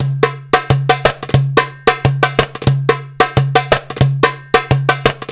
PERC LP, Arabian Traditions

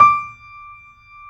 JAZZ D5, Keyboards Acoustic; USB Soundscan vol.17

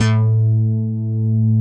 PPG BASS

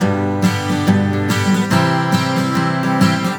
GTR, Celtic Flavours; USB Soundscan vol.42; AKAI

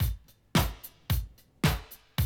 110, Classic Drum Loops

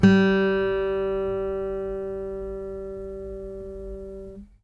ACSMEDPICK, Guitar; Quantum Leap; Guitar & Bass

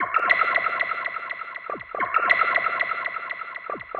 SFX, Trance NRG

